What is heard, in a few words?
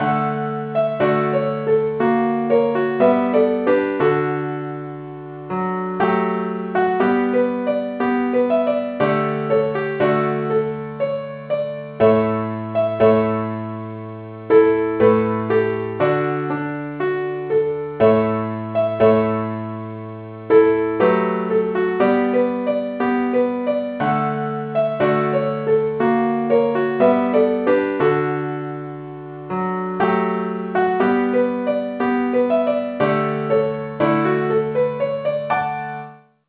keyboard Only key G